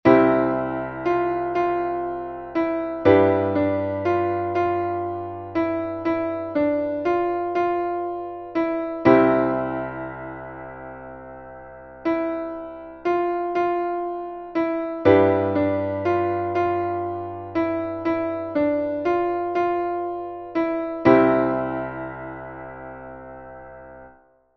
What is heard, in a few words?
BARCAROLLE